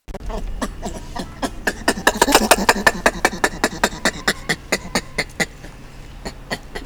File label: Macaca fascicularis